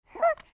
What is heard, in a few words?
squeek